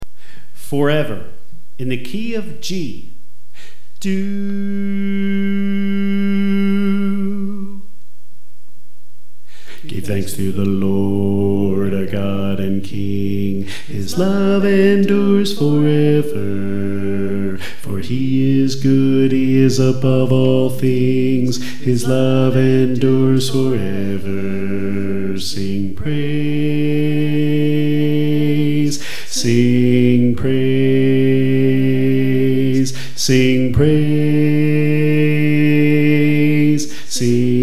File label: Bass